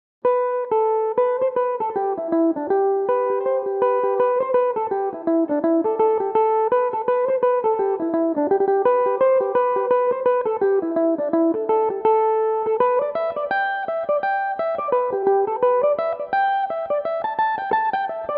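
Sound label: reel A dorian, irish mandolin, tunes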